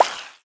Minion Ghost Disappears

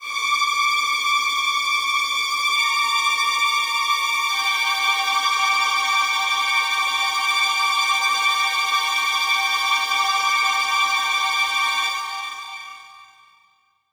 Violins; Dark Sector

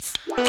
Standing By Cola Start; popslinger; ps4 ps5 switch windows xbox one xbox series xs

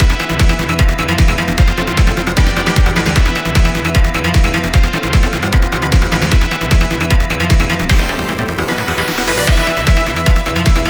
Battle Loop; Circuit Crystal

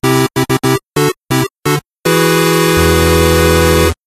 stage clear; Buff Knight

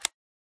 Click; The Joy of Creation Halloween

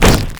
Splatter Explode; blastmorph pinball; xbox series xs

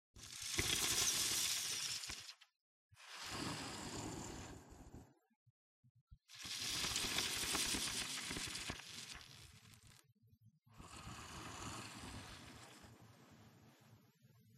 Spring breathing; The Joy of Creation Halloween

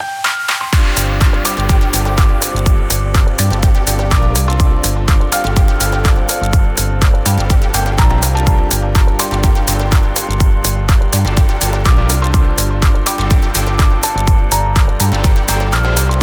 Menu Gacha Open; Goddess of Victory Nikke

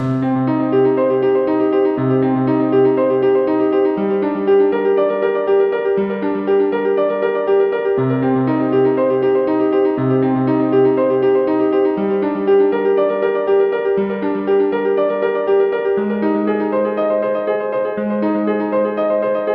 Abstract Experimental Track Looped; Dual Blade: Battle of the Female Ninja

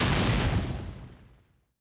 Flying Clash Object

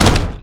Splatter Explode; blastmorph pinball; xbox series xs

